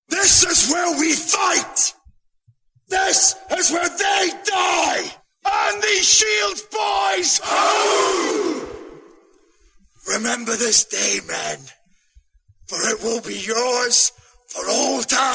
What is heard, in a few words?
sparta tone